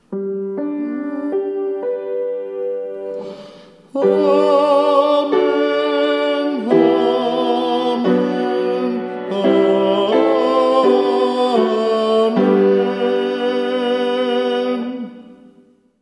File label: Amen, tenor; 3-fold Amen; choir